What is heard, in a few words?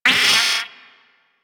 Vocal Pain; Warframe; Amalgam Moa